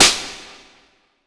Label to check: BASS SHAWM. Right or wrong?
Wrong